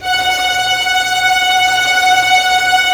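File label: tremolo F#5; Zero G; Man Machine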